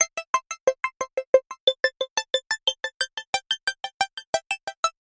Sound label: Arp; Creator